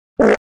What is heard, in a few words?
fart